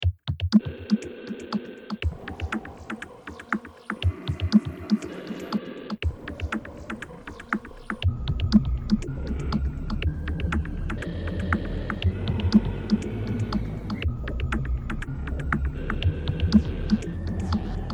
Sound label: pop noise remix